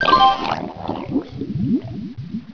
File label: drown; quake2; battlebrowzer